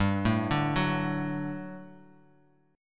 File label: G Major 7th Suspended 2nd, Bass Standard; GM7sus2 arpeggiated